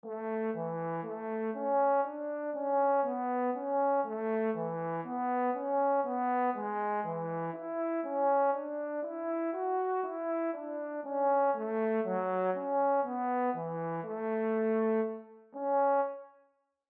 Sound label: Tenor Clef Example